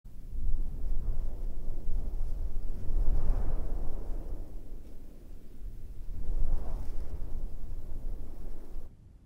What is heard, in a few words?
Arabian Desert sound